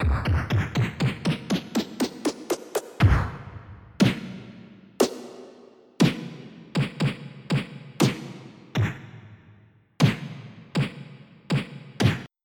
punch; Populous Demo

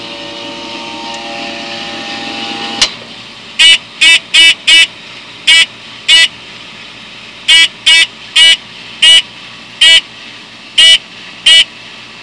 mac machine; Household

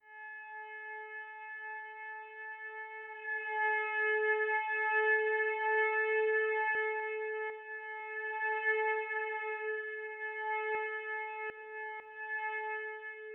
Plane2; Onj's Soundfont

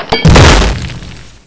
cluster grenade; Soldat

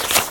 scroll; kult

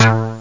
hos bass; The Atari Compendium (Toad Computers) (1994); music

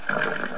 horse

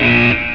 Buzzer; Triple-A 1.6

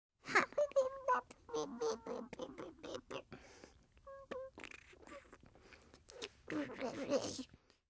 babble

